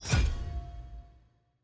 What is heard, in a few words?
arrow button click